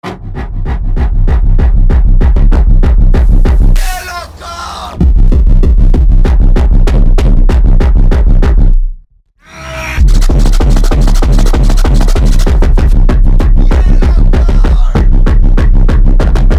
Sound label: yellow car